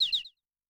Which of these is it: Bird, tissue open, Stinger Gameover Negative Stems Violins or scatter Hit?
Bird